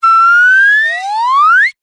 cartoon slide whistle ascend climb medium

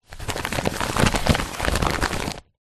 paper crumple movement; foley

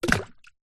foley plastic tub empty throw into deep water

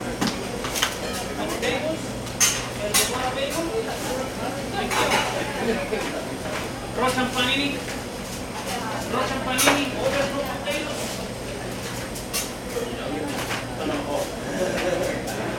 ambience restaurant cafe morning nyc; sound effects one

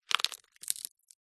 foley dry thick leaf or twig crunch break crush; zapsplat

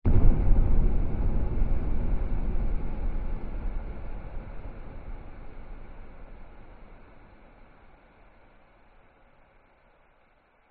cinematic hit boom airy; sound design

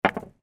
sport pool snooker balls x2 place down on table

